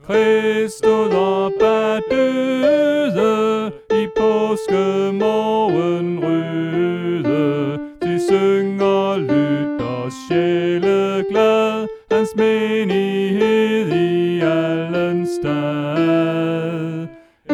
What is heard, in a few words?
Sopran